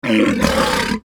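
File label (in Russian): рев моржа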